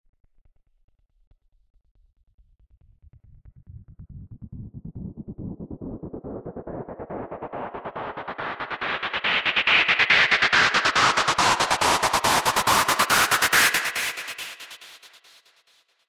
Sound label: Pink Noise Effix Delayed; Techno